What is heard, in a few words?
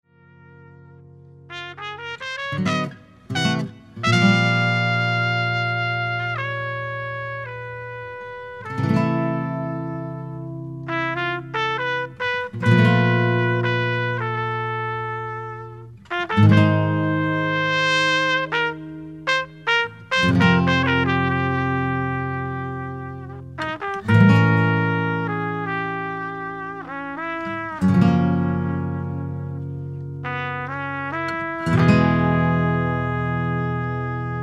a beautiful instrumental showcasing guitar, trumpet, flute, and synth